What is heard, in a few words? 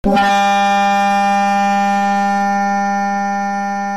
lobotomy sound